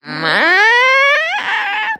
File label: door creak with mouth